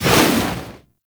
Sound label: Burst; Warframe; Purgatory Warrior